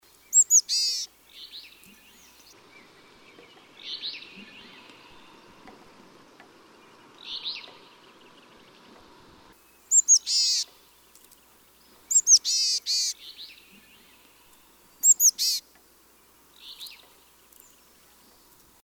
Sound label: Boreal Chickadee